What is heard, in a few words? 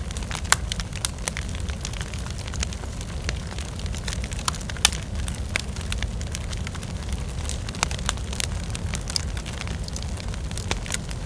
idle campfire; STALKER; IWP